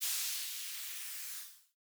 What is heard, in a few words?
slide; CrossCode